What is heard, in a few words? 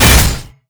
Chaos Blade Hit; MG Renewal; MUDevs S20.1-3